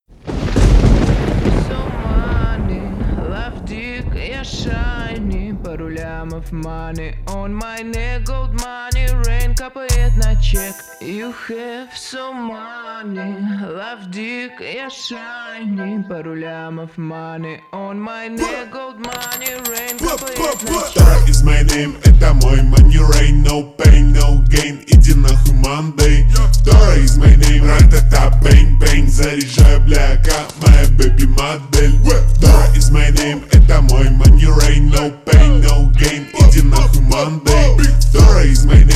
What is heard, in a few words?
money rain slowed remix